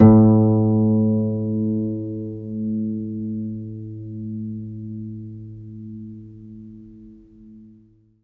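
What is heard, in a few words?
HARP B2 SUS; ILIO; Synclavier Strings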